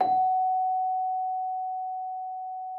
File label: Celesta F#5; Xsample VOL-8